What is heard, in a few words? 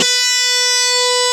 E4 GTR, Bass Guitar; AKAI S6000 CD-ROM - Volume 1; JOHNS GT